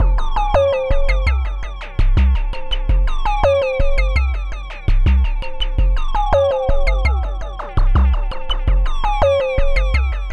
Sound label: Sine Wave, Processed Percussion